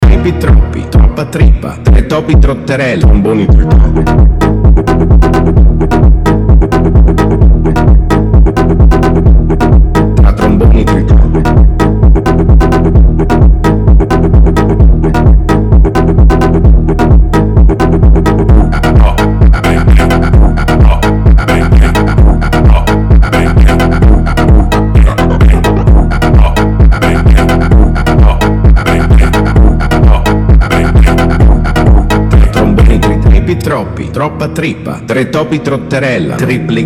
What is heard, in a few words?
funk